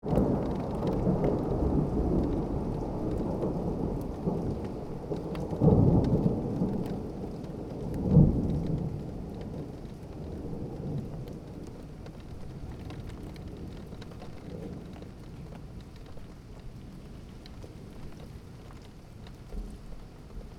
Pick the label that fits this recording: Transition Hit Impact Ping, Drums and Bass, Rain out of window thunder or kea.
Rain out of window thunder